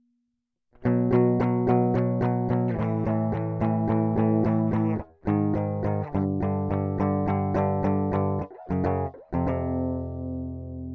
Rhythm Guitar

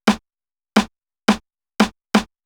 Hip Hop Snare Intro, Drums; 087; Pro Tools Essential Loops; Digidesign

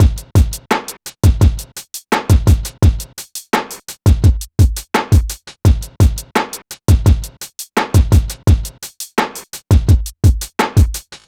Hip Hop Beat, Drums

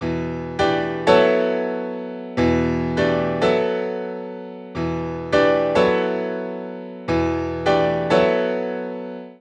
NuJazz Piano E; Digidesign; Pro Tools Essential Demos; Essential Loop Demos; Demo Session - Jazz

